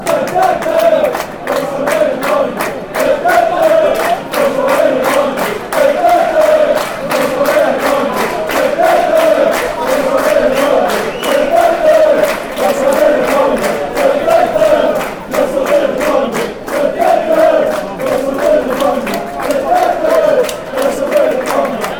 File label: conga, chants